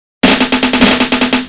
Fill, Snare Fills

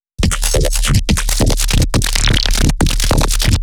weird bass

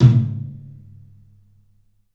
TOM, 3D Drums, CLUB TOM; 3D Sound; CLUB 12 TOM

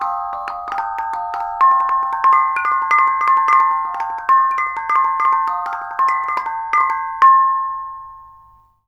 KONGWONG

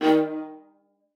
MART D#3, VIOLA SPICC; Xsample VOL-5; 90 s Sample CDs

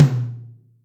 Room Mid, Acoustic Tom

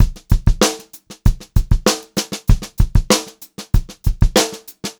POP VARI, Pop-Rock Drumloops; USB Soundscan vol.37; AKAI; 96 POP

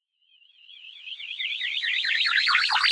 150 C Lazers Out Reverse

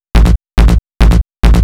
140BPM B; Vengeance - Essential Clubsounds Vol.4

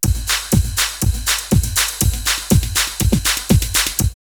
Beats 121, drumloops Techno House; Stiff Beats; X-Static Goldmine

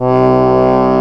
ACC F2; East West - Global Sounds; FOLKLORIK

